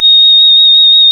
Synclav FM, WIND, A#; Big Fish Audio

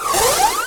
scifi, SCIFI & SWEEPS; Techno Trance Essentials

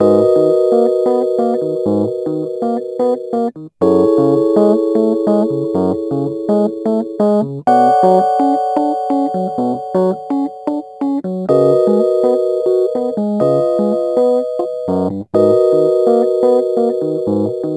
Rhodes Attacks; Ueberschall

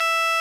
RMI; Sonic Reality - Vintage Timetraveler

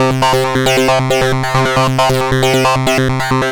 Synth

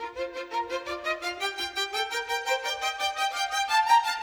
Violin; Sonic Foundry (Sony Creative Software); Orchestral VOL-2

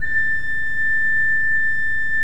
KOPPELFLUTE A5, Cathedral Organ; Propeller Island

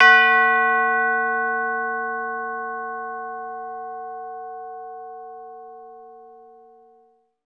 CHIME; Roland - LA Composer vol. 1

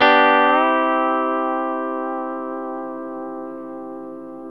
Pedal Slides, G TO C

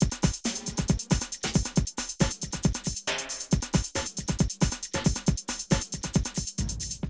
Drum loops, Rave & Hardcore; Zero-G Groove Construction (1993)

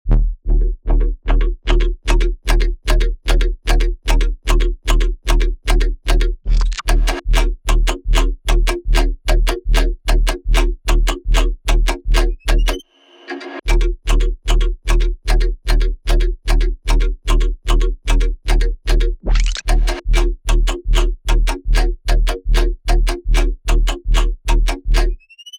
loop Ebm, bass

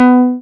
SYN TBM C5, MC202; Sound & Vision - Gigapack I CD 2

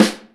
Brush Hit, EMU Proteus-2000

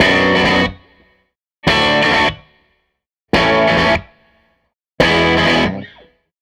SG Guitar Loop

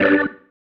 Stab, Organ, One Shots

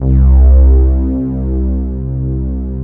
LUSH BED

